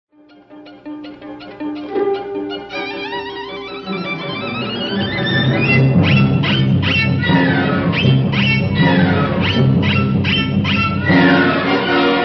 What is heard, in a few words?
Violin Concerto Excerpt; Prokofiev